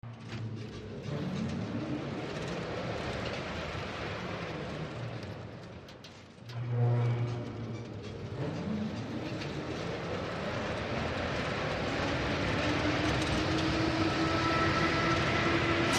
Crane Motor Movement

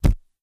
Camera flash, flash pot, deeper, less explosive; cameras

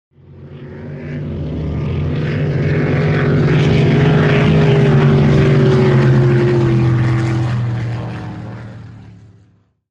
Power Boat By Fast, off shore power boat

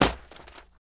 Telephone Book Drop

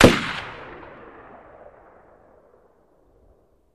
Gun Shot Sngl Ext

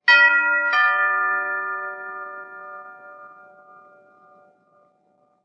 Door Bell Tube Bell Ch

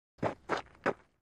Baseball FS Cleats; baseball fx & games

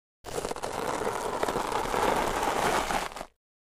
Object Dragged Acros, rocks & dirt

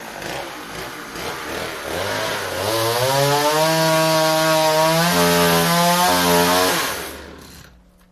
Chainsaw Rev Cut Off; chainsaws

